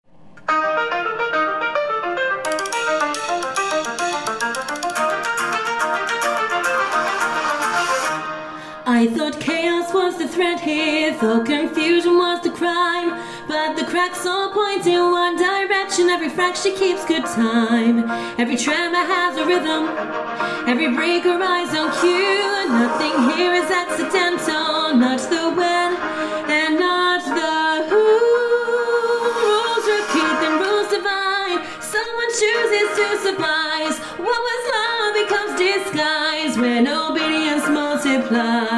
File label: Vocal; VOCAL GUIDE